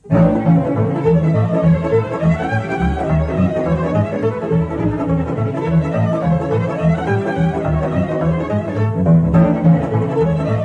Sirba Olteneasca - Roumanie

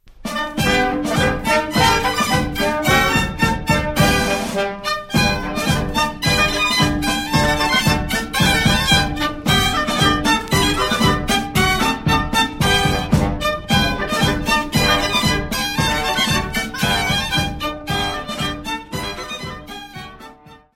Maclote de Rouette-Longchamps, Belgique

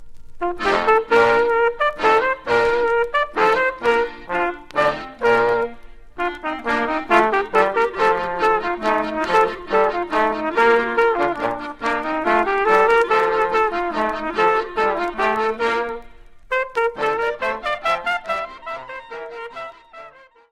Landler - Autriche

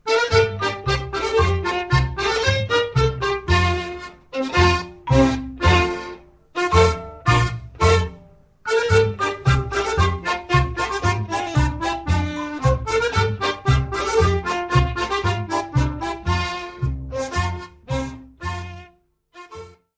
Kepelaar, Pays-Bas

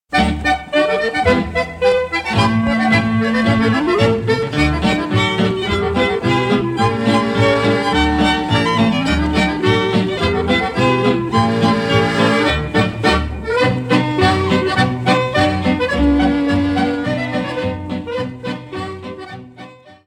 Kettengalopp, Suisse

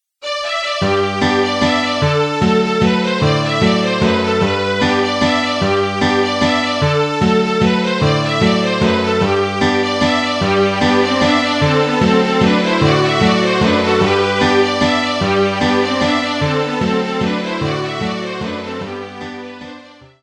Mazurka, France

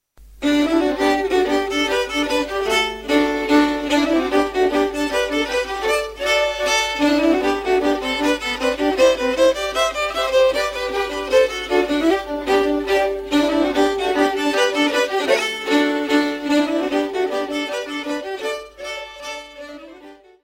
HORNPIPPE POLKA - Danemark

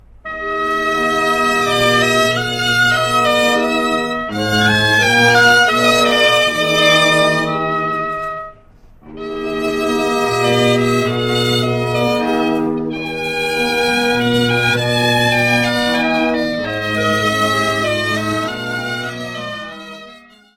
Menuet, Belgique

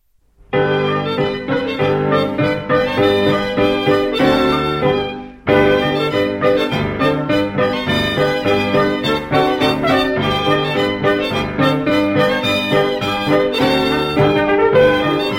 Maclote d'Habiemont, Belgique

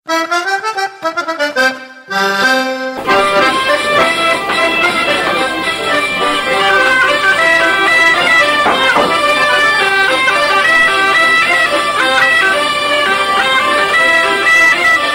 BOURREE UZERCHE france auvergne; Jaitraverse

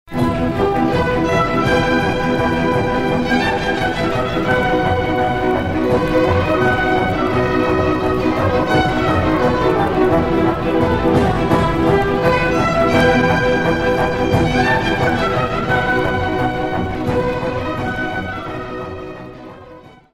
Sirba - Roumanie; Sirba Regimentului

